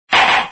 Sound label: gavel